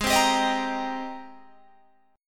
G sharp Major 9th G sharp, Guitar Open G

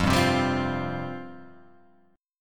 F Suspended 2nd F

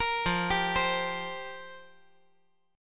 F Suspended 2nd Suspended 4th F down

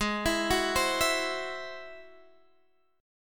Ab Augmented 7th, Ab+7 chord, guitar; arpeggiated

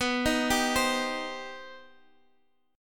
C Minor B; arpeggiated; Cm/B

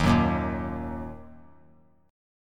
D sharp Minor, chord; strummed; JGuitar

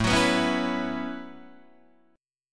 A 6th Add 9th A down Guitar Open D

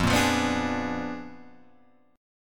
A sharp 7th Flat 9th F

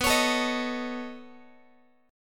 B 9th B down Guitar; B9 strummed; JGuitar

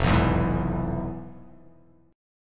F Minor Major 7th Flat 5th

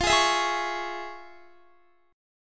F Minor Major 7th Sharp 5th, F, Guitar; strummed; chordshape

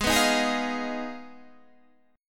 F Minor 7th Flat 5th Ab

